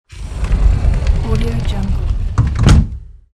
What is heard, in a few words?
elevator door close